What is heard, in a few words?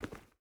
footstep rubber boots forest walk; Rust